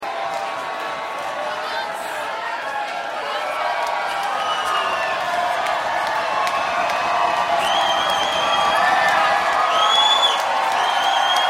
Crowd

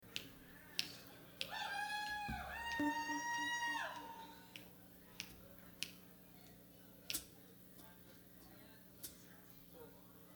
Crowd

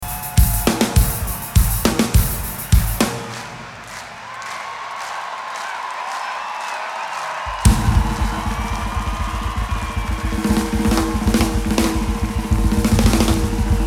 Drum Solo

